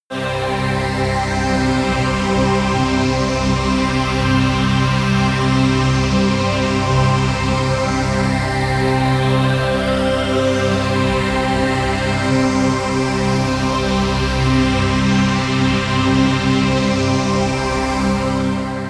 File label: pad sweep normal; flaechen